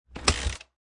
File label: Motorcycle Dirt Bike Kick Start Attempt; Motorcycle kick start. Attempt kickstart, engine not starting.